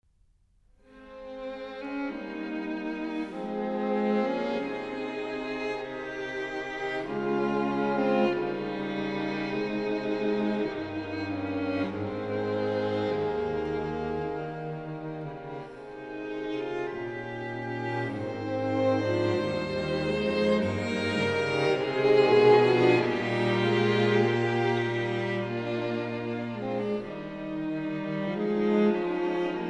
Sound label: string quartet c minor; bella